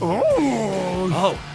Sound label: oooh